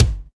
acoustic kick g key, Royality free bass drum tuned to the G note. Loudest frequency: 1334Hz